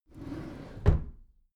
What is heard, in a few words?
packed drawer smooth close soft halfway